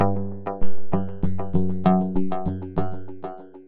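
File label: Space rock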